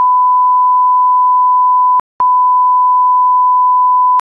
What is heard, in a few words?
aac128 1khz compare